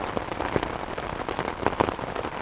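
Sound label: rain; Mafie 1 cz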